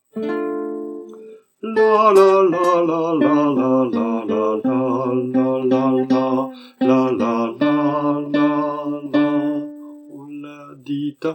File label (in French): alto; repertoire chorale